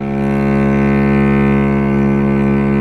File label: C#1 SOLO CELLO